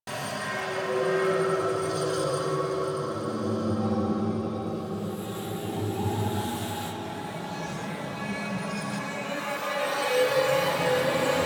Ambience Loop, Interior; Warframe; The New War; Zariman

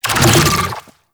Infested Move Start; Warframe

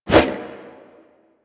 Sword Swipe, Whooshes; Weapons; Melee; Warframe; Pacifist Sword And Board

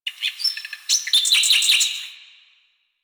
Drifter Camp Valley Tree Critter; Warframe; Quests; The New War

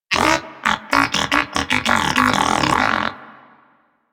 Moa Pet Skip Rope; Warframe; Tenacious

